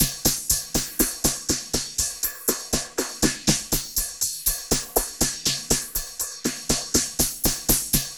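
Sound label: phase hat, drum loops; Zero G; Malice In Wonderland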